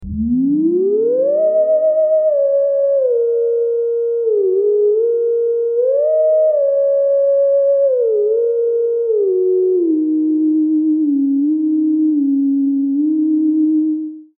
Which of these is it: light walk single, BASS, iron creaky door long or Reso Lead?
Reso Lead